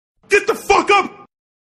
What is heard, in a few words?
chains; payday 2